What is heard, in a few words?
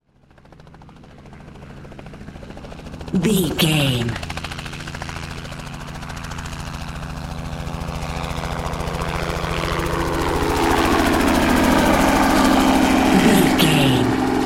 helicopter chopper idle takeoff pass